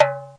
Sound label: ethnowhat, African and Eastern Percussion